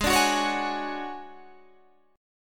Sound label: D Major 7th Flat 5th G sharp, chord